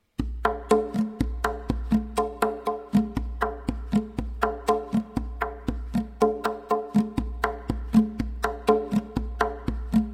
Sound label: tonbak